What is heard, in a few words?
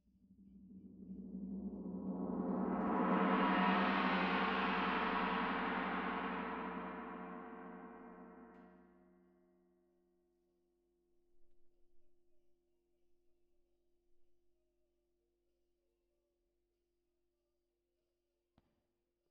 Tam-Tam Main